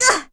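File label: Vox Damage, Korean; Chrisha; kingsraid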